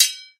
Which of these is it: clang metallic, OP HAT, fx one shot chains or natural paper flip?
clang metallic